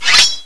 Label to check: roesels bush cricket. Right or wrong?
Wrong